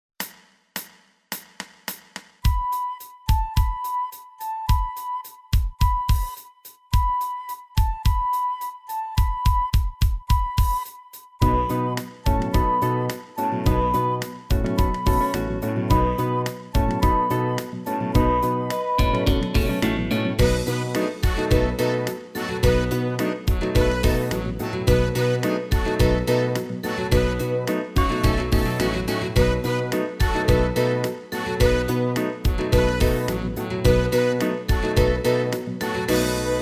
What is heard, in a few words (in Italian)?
A tempo di rock